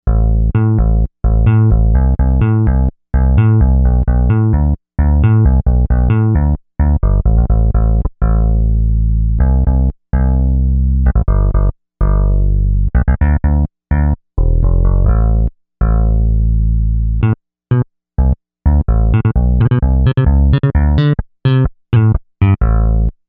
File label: Punch Digi Bass, Novation UltraNova; Factory Patches